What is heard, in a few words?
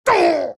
axe pain; dota